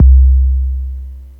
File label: 808 Emu